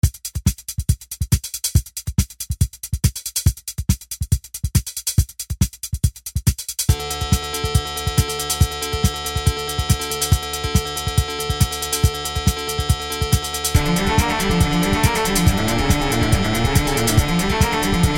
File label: beat